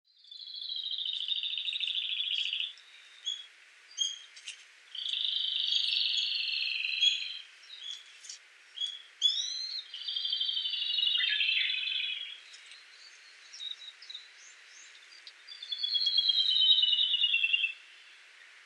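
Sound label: Rufous-tailed Robin; tobishima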